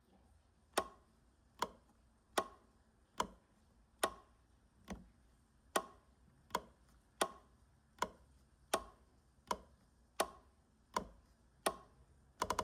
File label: Clock Ticking